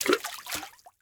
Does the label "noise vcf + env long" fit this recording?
No